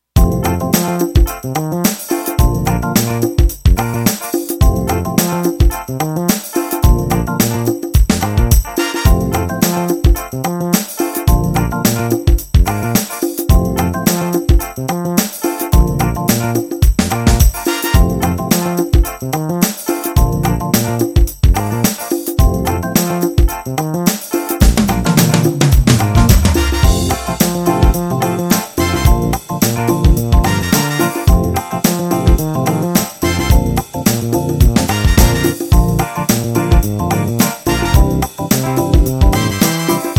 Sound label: 16beat funk